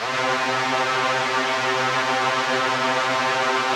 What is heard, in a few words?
SPACE STRINGS, Yamaha VP-1; Music Store